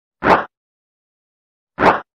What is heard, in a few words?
light unarmed attack